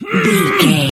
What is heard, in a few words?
voice male attack